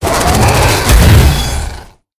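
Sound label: cryonics attack, monster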